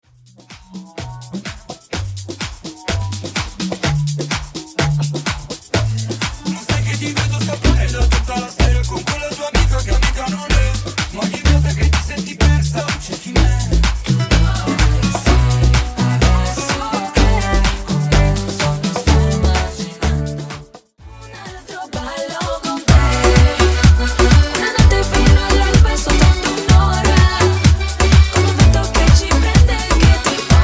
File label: Reggaeton